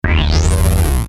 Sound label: Logo Rotor Phase Bass; Sonic Logo Sound Effects; Production Element Imaging Element Accent Transition